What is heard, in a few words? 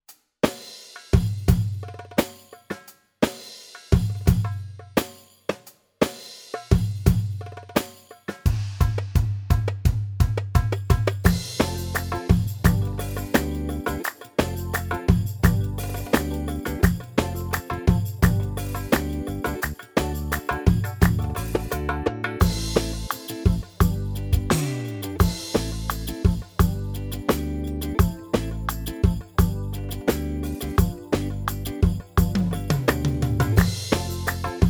Yamaha PSR-A5000 Style Saidi